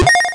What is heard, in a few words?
challenge check